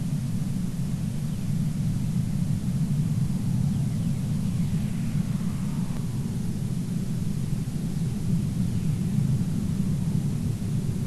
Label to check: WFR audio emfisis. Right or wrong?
Right